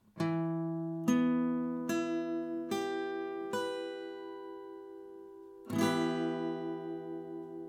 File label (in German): E Moll Barre